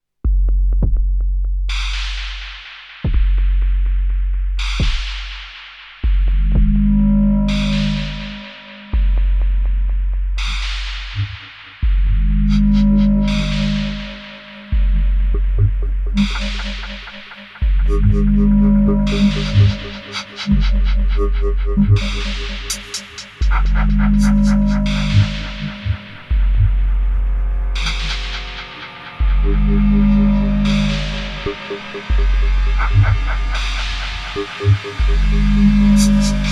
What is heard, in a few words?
Erica Synths Perkons HD 01 Beatdrone